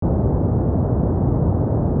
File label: stationary ship